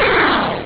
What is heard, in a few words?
spell fail; openrsc